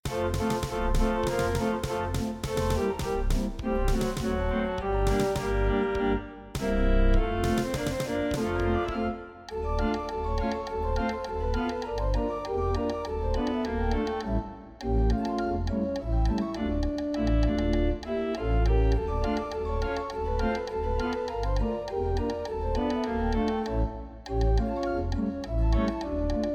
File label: Grand Player Orgen